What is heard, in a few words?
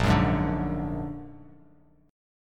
A sharp Augmented 7th A sharp, Chord; A#+7 strummed; JGuitar